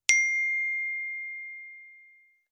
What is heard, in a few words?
single chime